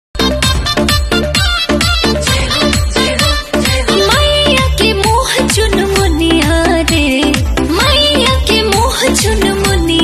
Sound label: bhakti ringtone